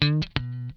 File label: lick scale, funk guitar; Zero G - Funk Guitar